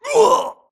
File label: grunt death quick; Titanfall 2